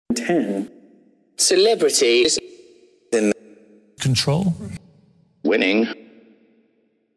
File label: SOUNDER; CELEBRITIES